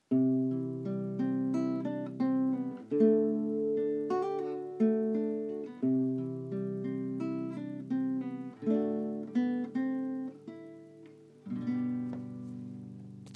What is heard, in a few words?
new tune